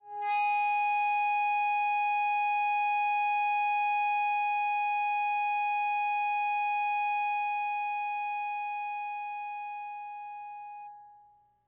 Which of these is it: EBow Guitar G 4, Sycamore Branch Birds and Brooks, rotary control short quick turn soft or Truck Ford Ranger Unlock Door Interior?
EBow Guitar G 4